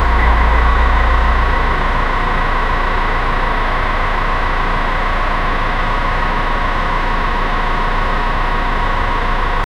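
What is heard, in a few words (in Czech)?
zvuk chrochtavého troubení, bus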